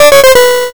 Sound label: lose